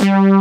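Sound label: ANALOG BASS; Pro-Rec - Synthsonic; BASSES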